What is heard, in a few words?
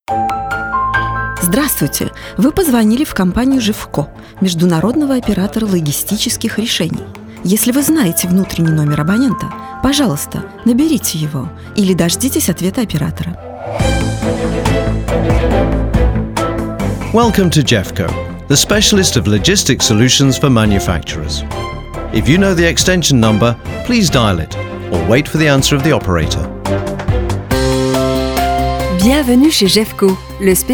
hold on music; gefco russia